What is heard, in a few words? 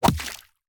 punch